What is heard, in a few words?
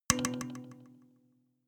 notification kalimba delay body hit